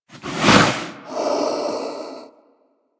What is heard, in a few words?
Snow Critter Vocal Breathe; Warframe; Hunting Critters